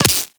Player Laser Impact Flesh; Warframe

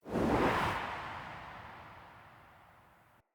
Soul Steal Latent Out

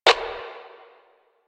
Static; Warframe; Lasrian; Lasrian Enemy A

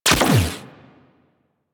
Turret Fire; Vehicles; Crew Ships; Weapons; Warframe; Rail Jack; Corpus Crew Ship

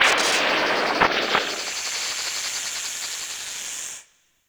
Snake Pit Stinger; Warframe; Ambience; Nineteen Ninety Nine

